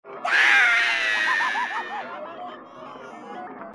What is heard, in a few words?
grunt screaming yelling; grunt crazy